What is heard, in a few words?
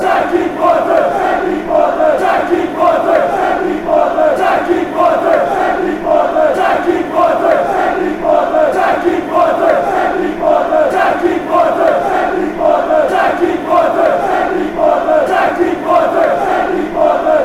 chant, beerschot